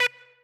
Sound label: message admin; LuaUI